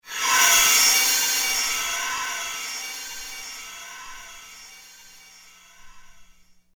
scary; Страшные звуки для монтажа

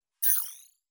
sci-fi interface zoom

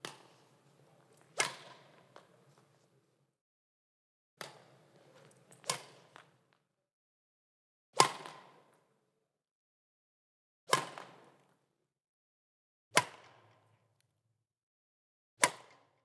badminton hit smash hard; Звуки игры в бадминтон

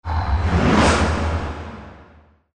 Voom; Звук саспенса

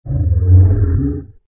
creature growl; Звуки монстров; Монстры

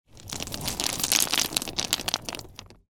small stone avalanche

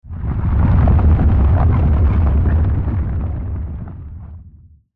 rockfall

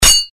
ghost stunned

